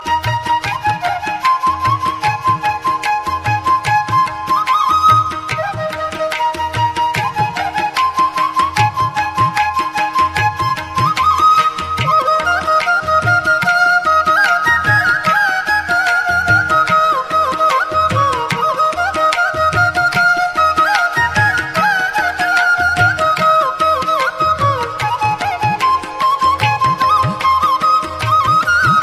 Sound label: Flute Fusion